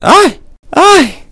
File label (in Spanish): ayay